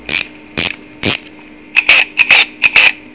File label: robot